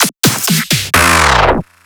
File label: filler tool 128 F; Electro; filler tools; Anthemic